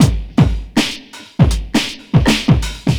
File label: Beat, Drum N Bass; Drums & Percussion; Beats; Sonic Foundry; Futurist Drum & Bass